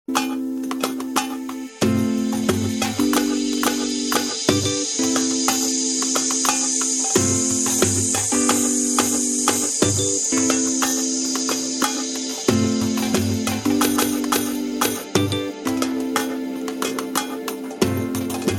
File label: Ambient Chillout Ringtones